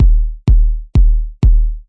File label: deep four on the floor kicks